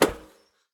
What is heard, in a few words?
walk proflist; Escape from Tarkov